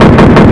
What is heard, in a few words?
cannon